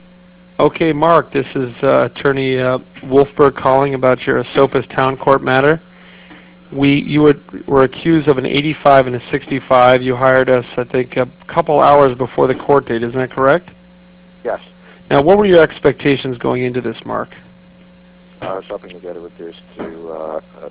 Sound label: march